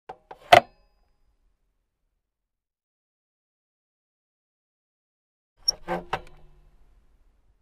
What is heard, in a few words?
Motorcycle is put on center stand